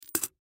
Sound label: Piggy Bank Coin, Piggy Bank Coin Drop In Full; Money; Foley; Blastwave